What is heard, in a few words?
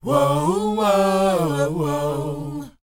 WHOA D, Vocal R&B & Dance; ILIO; Vocal Planet